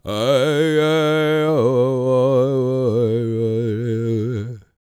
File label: World Voices, CHOCTAW